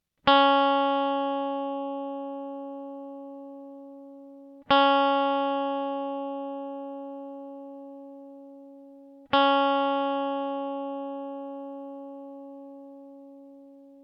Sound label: drop b; Drop tuning your guitar is simply where you tune the bottom string